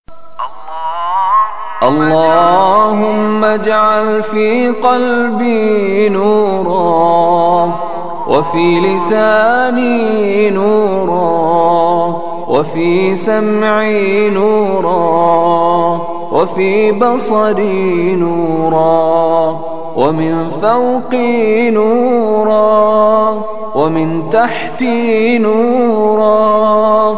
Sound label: DUA RINGTONE